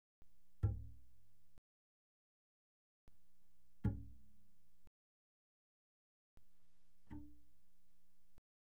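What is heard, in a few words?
Bass pizzicato pianissimo sul D C4 D4, doublebass; Strings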